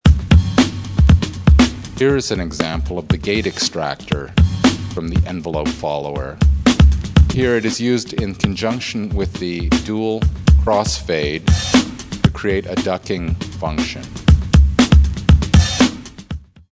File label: ducker example